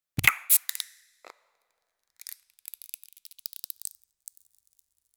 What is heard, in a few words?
transition soft